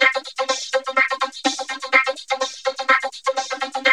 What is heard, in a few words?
VOC LOOP, VOCODER LOOP; Zero-G Ambient 1; VOCODERLOOP1